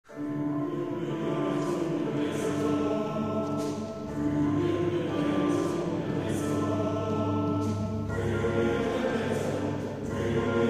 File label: Kyrie, Kammerchor